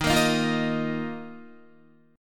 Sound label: Eb Suspended 2nd, Guitar; Ebsus2; strummed